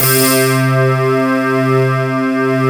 ROCKIN C3, Synth; ROCKWIRE

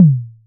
toms, disco; Best Service ProSamples vol.48 - Disco Fever; AKAI

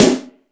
All Snares, E-MU Pro-Cussion; drum machine

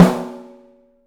OPEN STEEL SN; 3D Drums

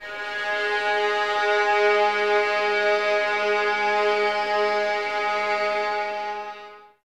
Violins Sul Ponte G#3; String Ensembles; Miroslav Vitous; 11 Violins

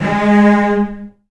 Virtuoso Strings, TRM FX; ILIO; Kirk Hunter Virtuoso Strings VOL-2; 90 s Sample CDs; 10CL TRM FX

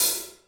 open hh; realdrum

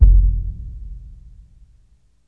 ORCH. BASS; InVision Interactive; Lightware VOL-1

